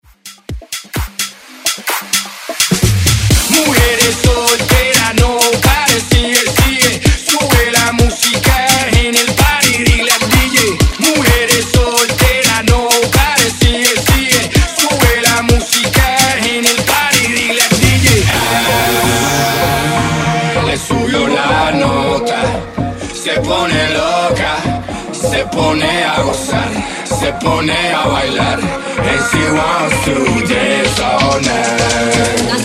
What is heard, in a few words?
Latin Dance Redrum